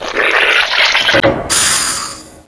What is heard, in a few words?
Molding Machine 8 Bit; abbottabad compound